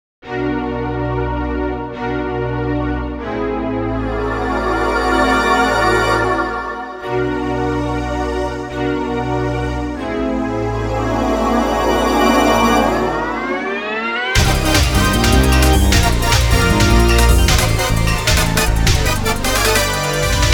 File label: Stage Start; Mega Man X3; Saturn; Blast Hornet